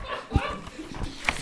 haha

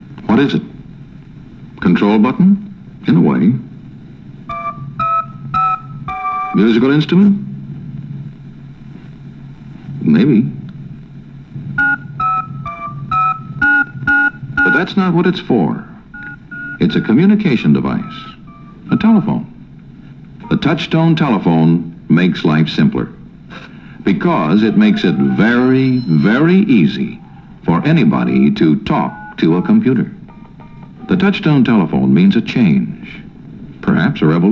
commdevice

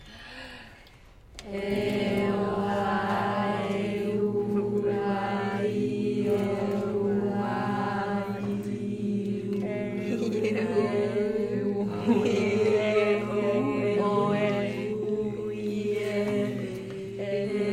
meetings choir; leeszaal

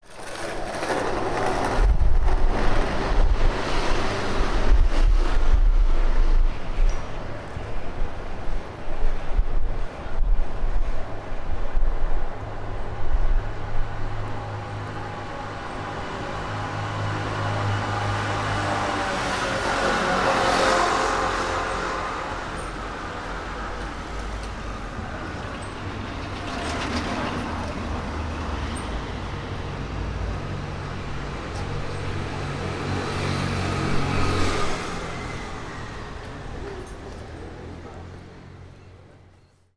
chinatown

